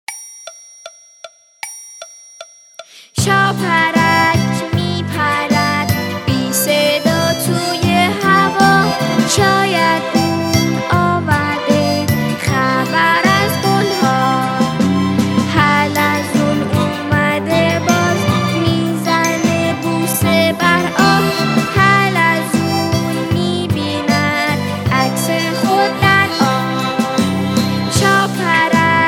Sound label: Vocal